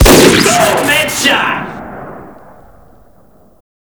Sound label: sniper shoot crit